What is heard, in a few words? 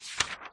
turn